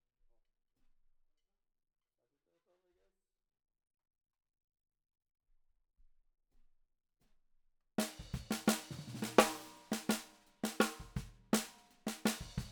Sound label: Snare Bot